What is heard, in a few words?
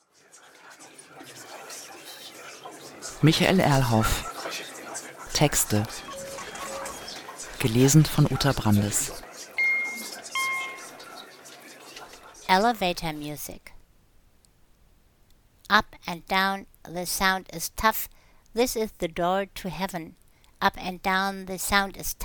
Elevator Music